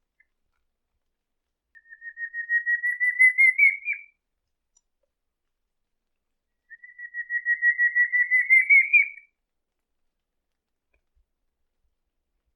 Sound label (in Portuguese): Canto do pinto do mato